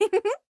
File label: Giggle; Tracer